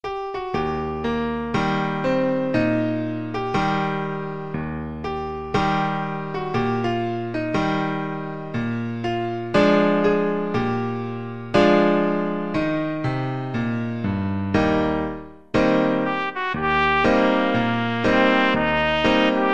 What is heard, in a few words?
trumpet